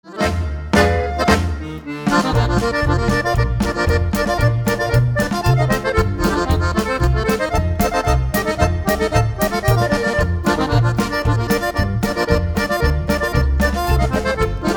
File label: Clarinet Polka